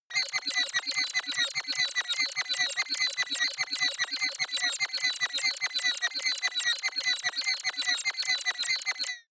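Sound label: crazy baby birds, science fiction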